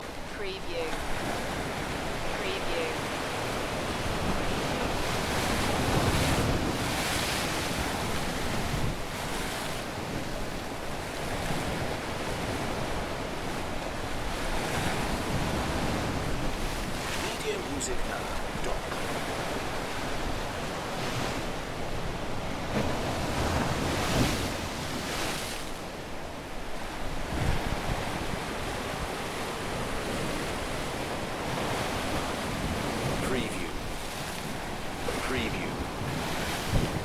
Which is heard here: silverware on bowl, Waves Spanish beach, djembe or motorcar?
Waves Spanish beach